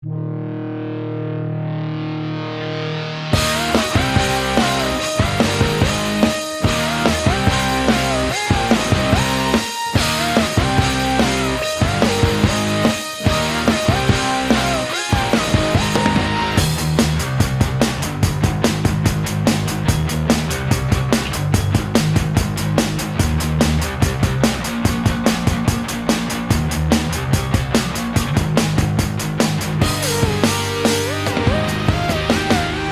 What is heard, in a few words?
Pop Punk (Instrumental)